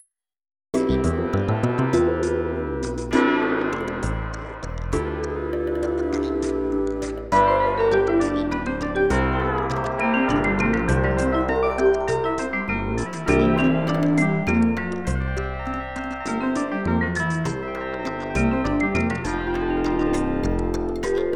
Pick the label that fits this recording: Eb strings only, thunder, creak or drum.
drum